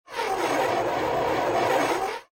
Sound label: Cross Over Whooshes; Andrew Fleming